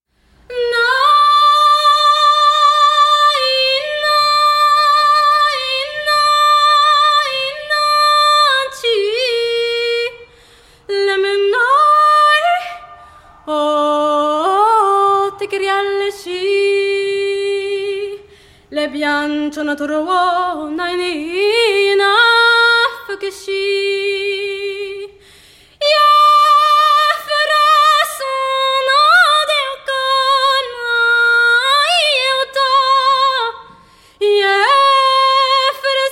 one pitch birds for distortion and mouth synthesizers